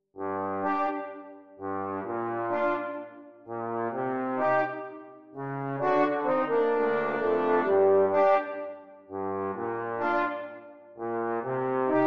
Brass Quartet

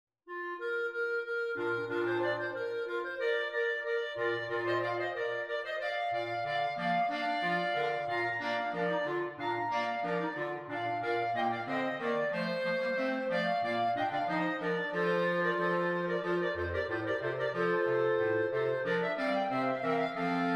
La Rejouissance clarinet quartet; handel

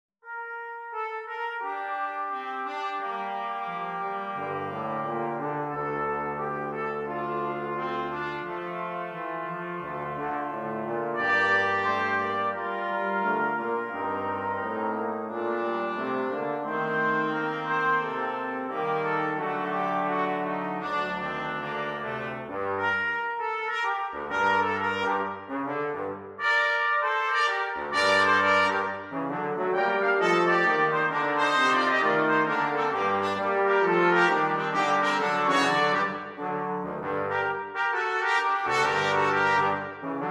brass, jazz brass quartet; Carol of the Bells

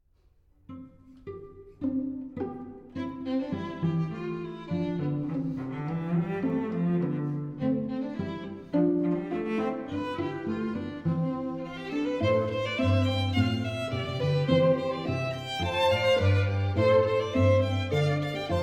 string quartet

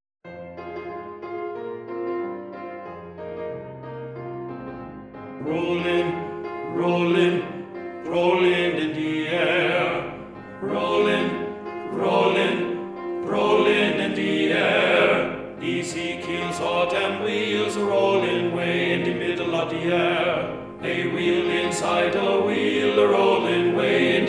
Ezekiel, TTBB

